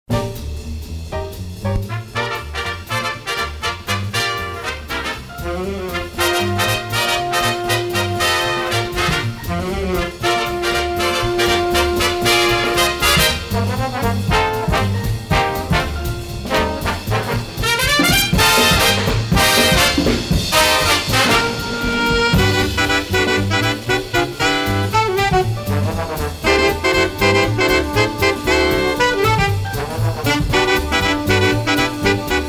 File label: Instrumental; PAMS